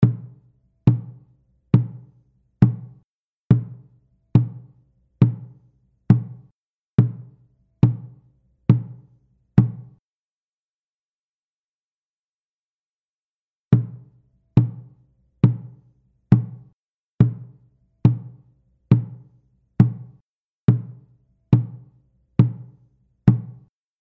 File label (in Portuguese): CAXAMBU entonação tímbrica